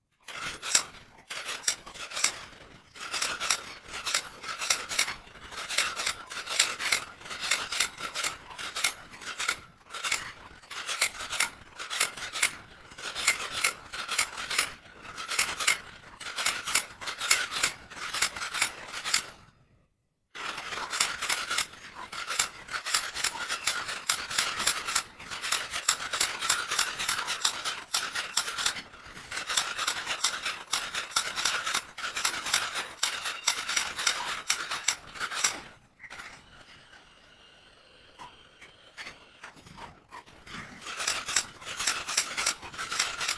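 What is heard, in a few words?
typewriter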